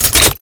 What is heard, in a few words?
Ink Rifle Mech End; Warframe; Tenno